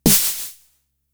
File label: FX, Drum Machines; Fricke Schlagzwerg